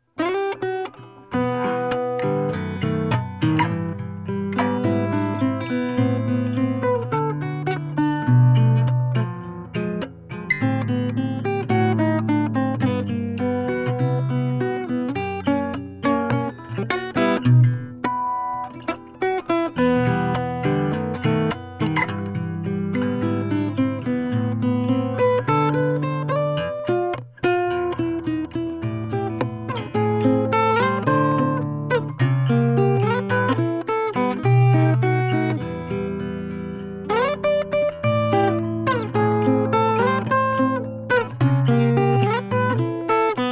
kyairi

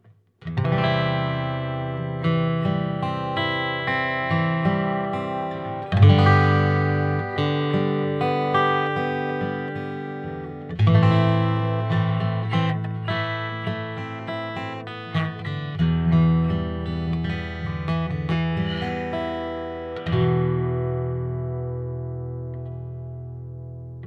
yamaha fg 500j, guitar

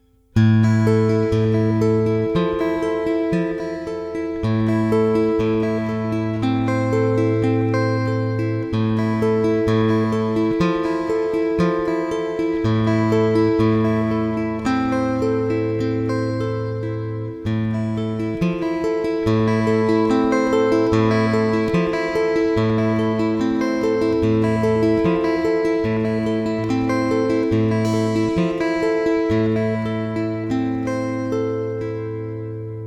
Gibson J-45, guitar

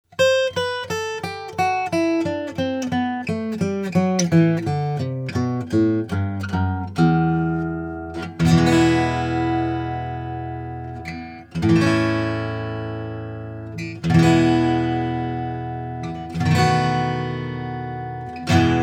Gibson J-45, guitar

